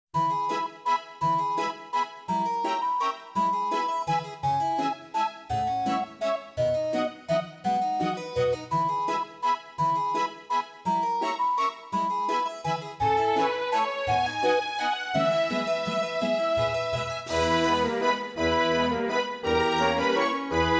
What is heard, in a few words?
Barefoot Waltz; Culdcept